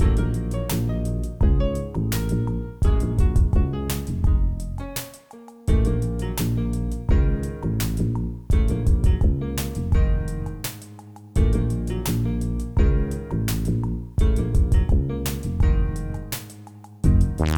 jazzy beat

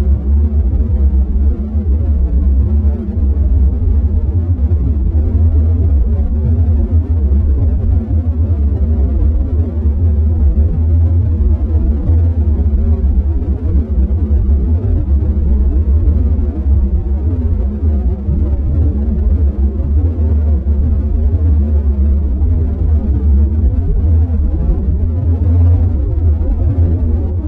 warbling; Our victories were won inch-by-inch, bit-by-bit, in this new realm of cyberspace.

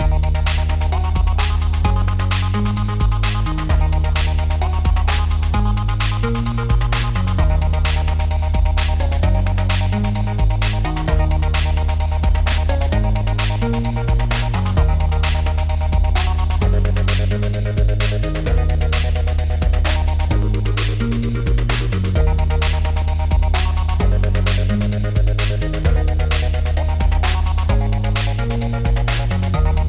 evolving forces loopable; Mystical Songs of 2020

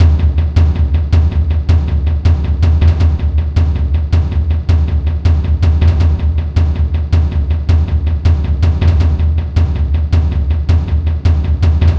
Cinematic percussion loop

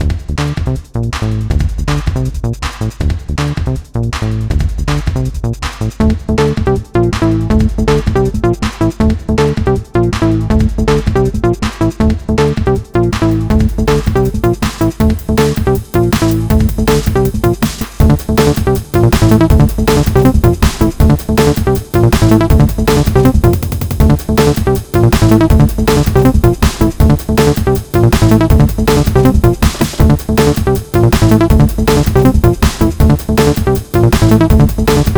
160bpm electronic loop, Little electronic beat; Little electronic beat I put together.

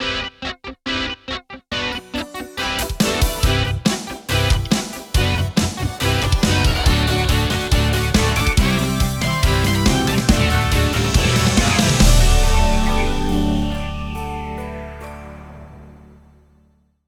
jingle

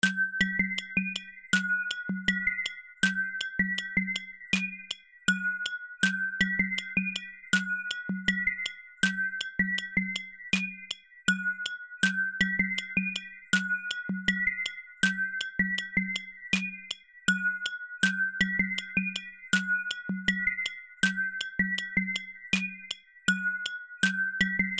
Background Music

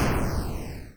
laser

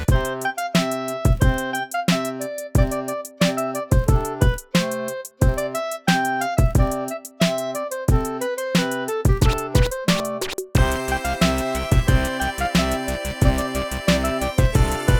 happybeat

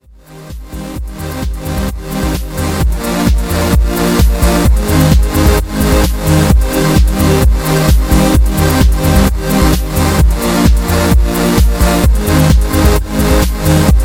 electro, poppy electro track

